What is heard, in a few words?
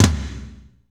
TOM, Drumscapes Roland; Northstar - Drumscapes Roland